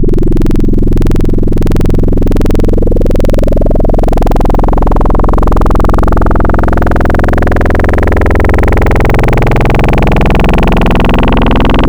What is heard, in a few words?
Fm Grunge; Synthetic's Serum Collection; Cymatics - Nuclear for Xfer Serum Wavetables